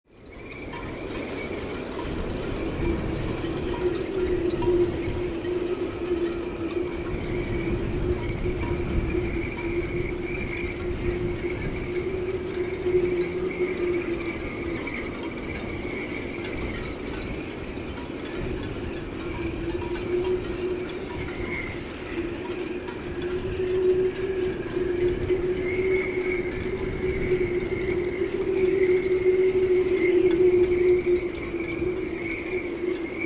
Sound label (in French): Tempete, sons; Début d’une tempête sur le port, stéréo; Locmiquélic, France